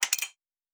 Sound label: Metal Tools; Sci-Fi Sounds